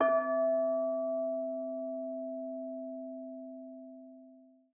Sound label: amb harbour